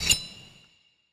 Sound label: Normal slash; Illusion UE5